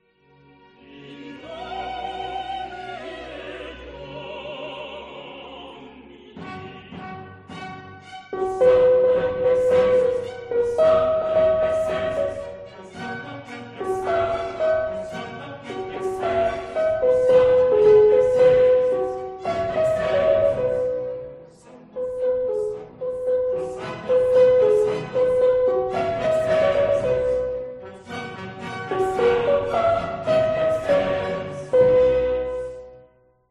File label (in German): Benedictus Sopran; spatzenmesse